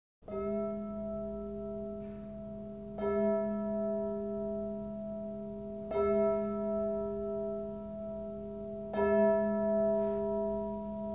Sonido de campanas tubulares; Instrumentos de percusion; Percusion determinada; laminas

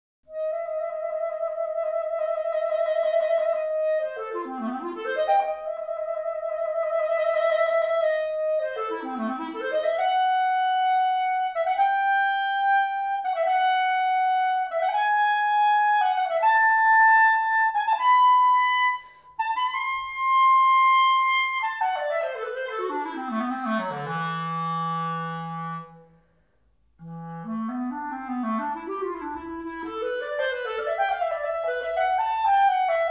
Sonido de clarinete; Instrumentos de viento; Viento-madera; clarinetes; clarinete soprano

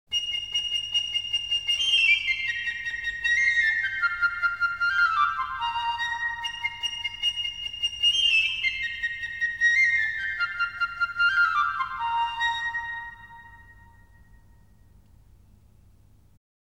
Sonido de flautin; Instrumentos de viento; Viento-madera; flautas

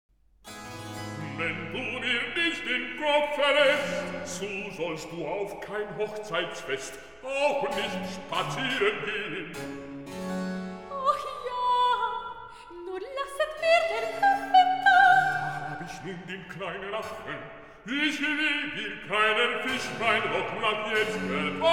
Recitativo de bajo y soprano; Cantata BWV 211, Cantata del Cafe, de Bach; Barroco

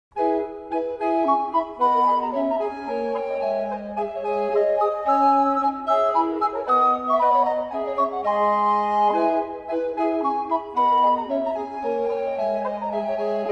Gallarda, Renacimiento; Agustine Bassano; HISTORIA DE LA MUSICA

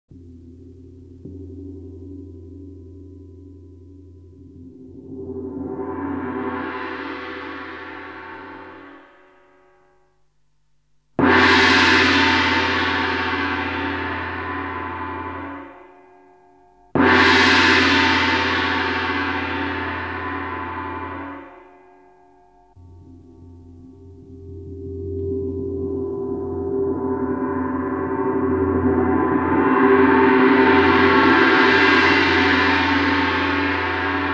Sonido de gong; Instrumentos de percusion; Percusion indeterminada; metal

